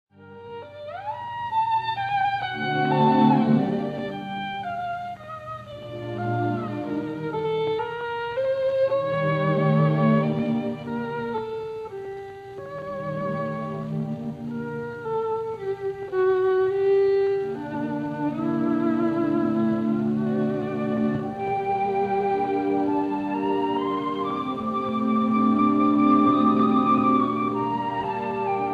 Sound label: Violin Concerto in D major Cansonetta Andante; Tchaikovsky; Op. 35